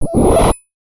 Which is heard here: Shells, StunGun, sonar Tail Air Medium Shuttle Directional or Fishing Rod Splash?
StunGun